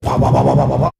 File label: bwahwahwahwahwahwah, Voice; ooh ahh